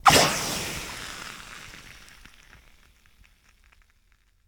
Steam Sizzle Hit

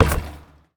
Street Ball Glass Basket; SFX Kit

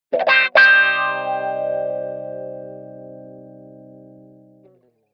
Guitar Thin Wah-Wah Finale Chord - Double; Hollywood Edge; sound designer's toolkit v2

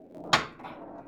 Pinball Ball Roll Hit

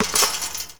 Street Ball Metal Basket; SFX Kit

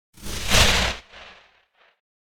Whoosh Sharp Wave; Hollywood Edge; sound designer's toolkit v2

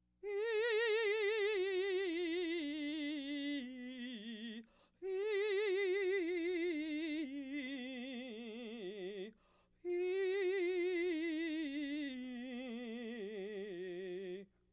Laugh tracks

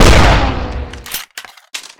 Gun Sniper Rifle Shot Eject, Weapons

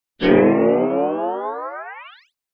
Slide Guitar Comic Stretching - Lower; Hollywood Edge; sound designer's toolkit v2

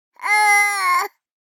BABIES TALK Boy 12 months Uhhwuhh; Baby Boom

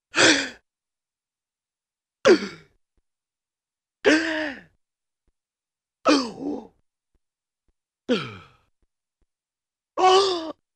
Gasp-inhale, Cartoon; Hollywood Edge; Cartoon Trax

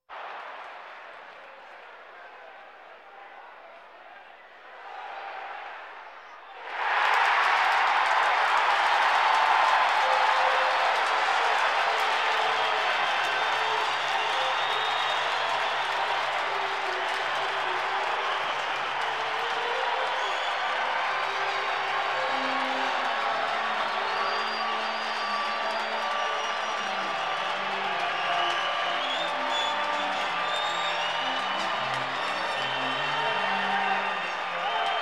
Sports crowds; Valentino Production Sound Effects Library CD50

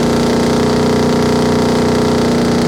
Mustang GT350 Loop, Automobiles

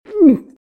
about To Drown; RadwinIsland

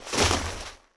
Bodyfall Snow; SFX Kit

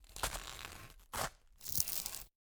Metal Tools

